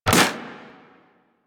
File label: Primary Shot, Weapons; Sapient